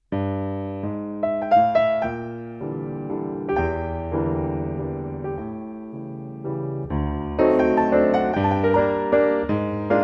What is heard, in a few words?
piano; performance today